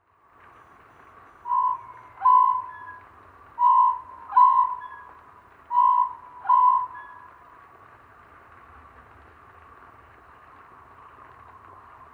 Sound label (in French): chant; Chant 4 moins 2 octaves